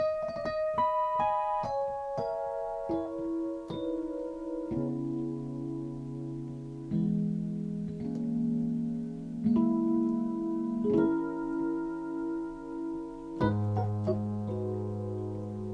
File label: rhodes sketch